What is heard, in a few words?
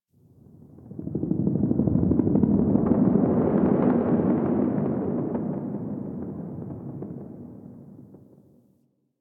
Distant Ambient Helicopter